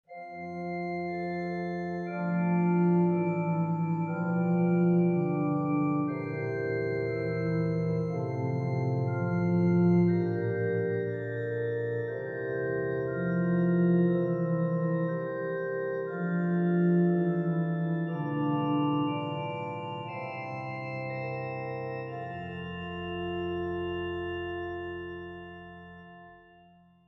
Vierstimmig Zweite Gattung

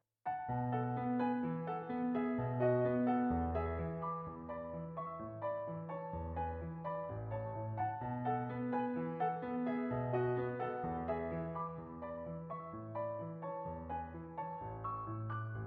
Bagatellen fur Klavier Allegramente

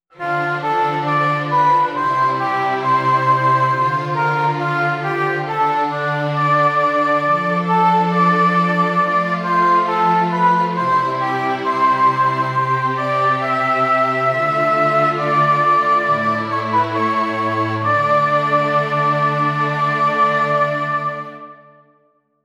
Stimmiger Contrapunkt; 5 Gattung; Ronge; corretto

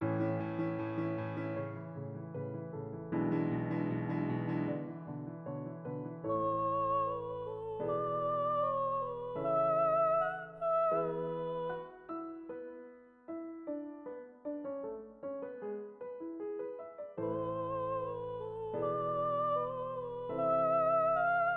Lied Andant; Hess 93b Musik zu J.W. von Goethes Trauerspiel Egmont; Freudvoll und Leivoll

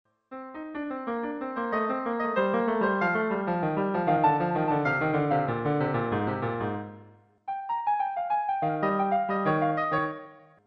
Kadenz; Unbekannte Kadenz zum ersten Fassung des B Dur Konzertes

